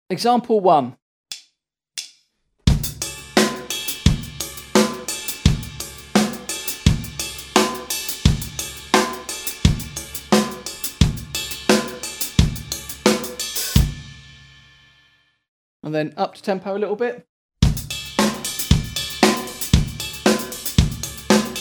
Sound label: drum set